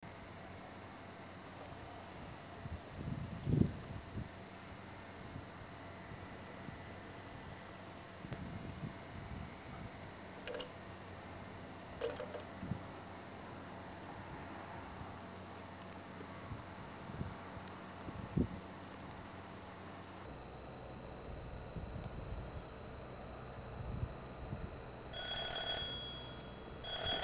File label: telephone